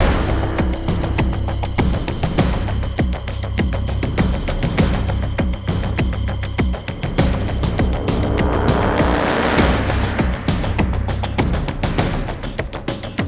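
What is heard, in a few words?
SYNTH PERCUSSION loop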